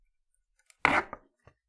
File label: Palette, WoodenPalette